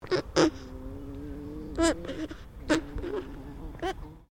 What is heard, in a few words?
ANIMAL ALPACA BABY GRUMBLE; Alpacas on a farm in Gippsland Victoria; Miscellaneous Animal